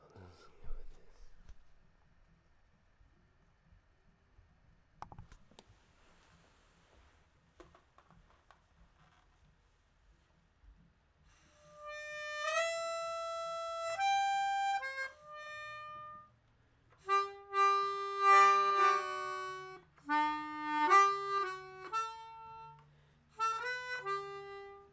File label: MELODICA, Instrumental; SeroNoise